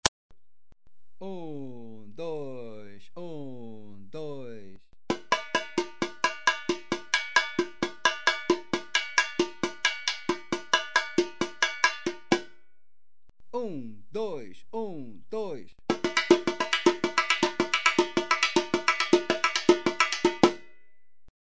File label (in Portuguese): repique